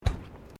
Footsteps Bare feet Wooden Deck Step; Footstep Foley; Human

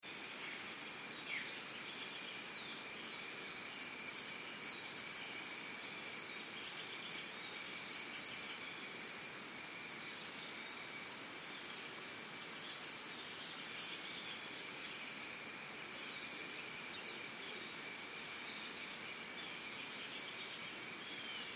Birds Crickets Costa Rica Early Morning; Backgrounds; Forests and Jungles; Big Room Sound

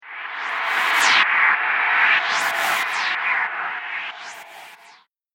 Wormhole Deluxe, Sci Fi; Sci Fi Sound Effects

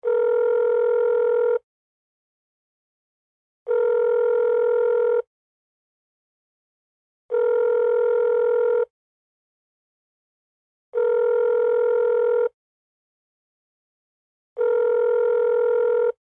Telephone Speaker Phone Ring; Technology; Electronics

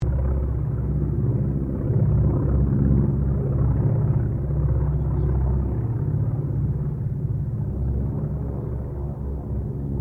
AIRCRAFT CERES WINJEEL WIRRAWAY PASS DISTANT; Mixed aircraft squadrons; Airplanes

